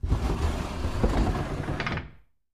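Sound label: Dumb Waiter Door Raise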